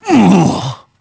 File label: dead, male; Pirates Of The Caribbean